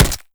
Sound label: flesh npc, bullet impacts; physics; Half Life Model Pack